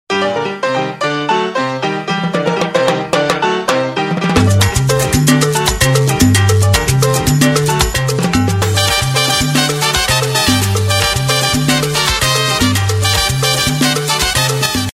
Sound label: salsa hard